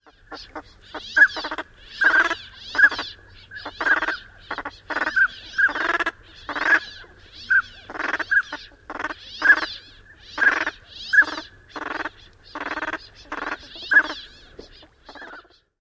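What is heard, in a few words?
Northern Pintail, male calls and female chatter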